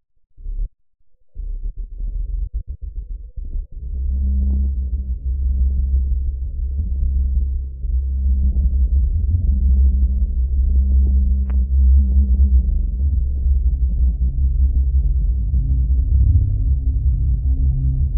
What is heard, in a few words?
drone